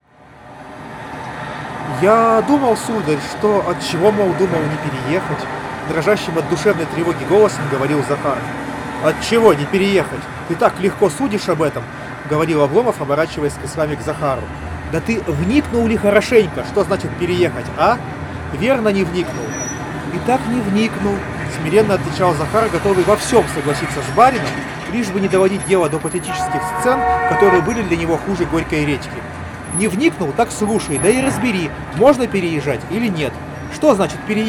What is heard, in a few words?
Recorder noise; honor choice headphones lite